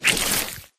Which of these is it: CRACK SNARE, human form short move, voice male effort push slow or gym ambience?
human form short move